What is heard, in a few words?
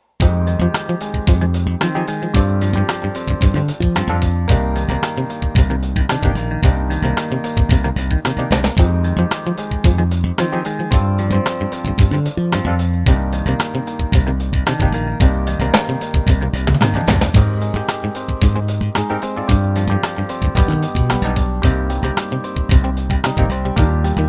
16beat funk